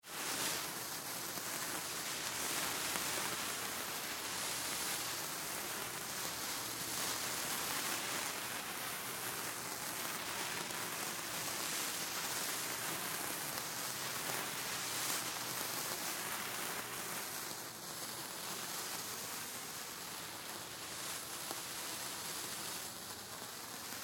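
Fire Sparklers Multiple Burn Gentle Moves Medium Far; Chinese New Year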